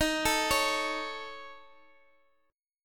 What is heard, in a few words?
C sharp Suspended 2nd Sharp 5th, D sharp